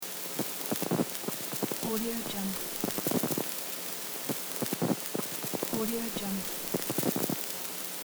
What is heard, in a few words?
tape sound loop